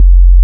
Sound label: yuppie, Bass